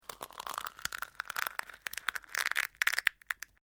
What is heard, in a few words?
Dry Brittle Snapping Long; Various Organic Foley sounds; Human